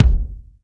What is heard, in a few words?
Super Kick, EMU Orbit-3 drum machine; 300 Drum Machines